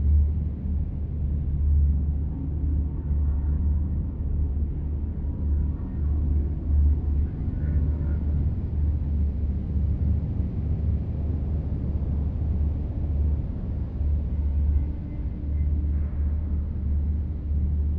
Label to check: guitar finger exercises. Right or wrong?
Wrong